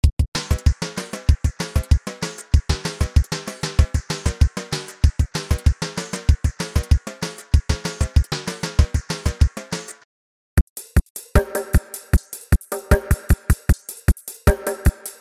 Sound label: Drum